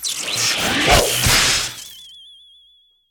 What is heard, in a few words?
whip